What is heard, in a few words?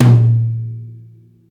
short reverb tom drum sound a sharp key